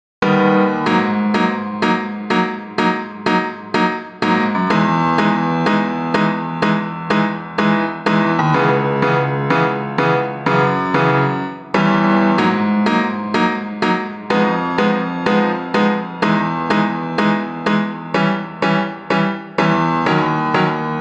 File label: piano bass big